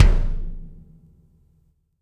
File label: Kick Shard, Processed Drumkits